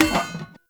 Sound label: Street Sign Destroy; Warframe; Ambience; NineteenNinetyNine